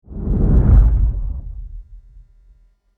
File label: rocket in